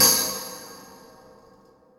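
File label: tambourine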